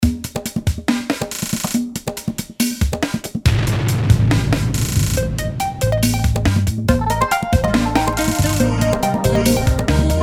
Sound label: Jingle; OnTv vol.16